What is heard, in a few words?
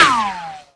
ricochet, bullet impacts; Half Life Model Pack